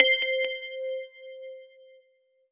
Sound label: Roland GR-1 Bell Ping C5